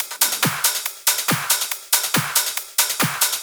Loops 140 BPM Hihat Percussion Loops

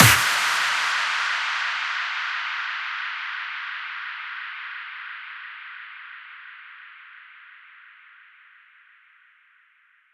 Impacts, Effects

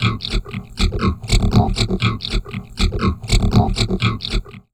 VOCOD, Drum Loops Crazy Processed

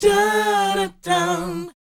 BOYZ D, Vocal Planet R&B & Dance; ILIO

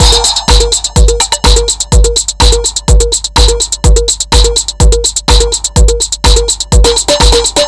Drums Strange

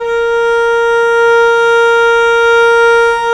A#3, VIOLE, Historical Instruments; USB Soundscan vol.23

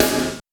snare, Percussion; Giga Samples Collection; ELQ-DrumQit

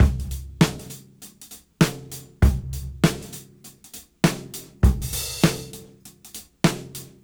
Cym Beat 99 BPM

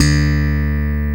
BS BRITE B, E. Bass, 5 str; Roland - Rhythm Section

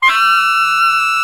SAX Tenor GROW; Roland LCDP07 Super Sax

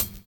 VINYL HH, HH CLSD, Drums Dance & Groove

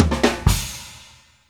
FILL

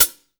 HH PD, Drum 1 Dry; East Collexion

